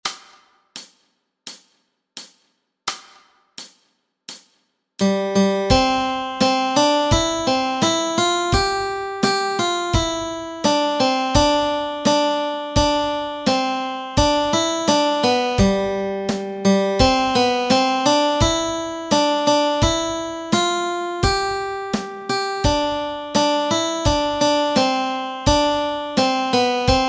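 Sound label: melody, Easy Guitar and Ukulele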